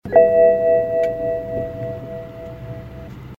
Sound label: BMW 535D failure